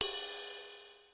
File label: live ride, cym; sr16